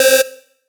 Synths C; Vengeance Samples

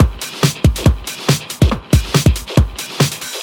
Loops BB 140, BreakBeats; Vengeance Samples

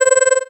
Synth Sound; Vengeance Samples; VEE Synth Shots

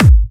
Bassdrums UK Trance Kicks; Vengeance Samples

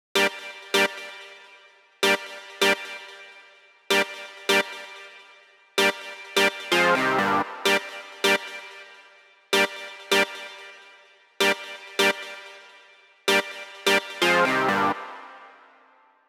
128BPM Chords Root G; Vengeance Samples; Hopper Kit

